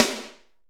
airy acoustic snare sound g key

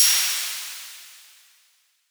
crash cymbal drum sound f key

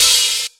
small reverb open hi hat sound g sharp key

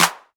original clap one shot f key; single hits one shots

